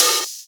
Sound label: Open Hihats, Cymbals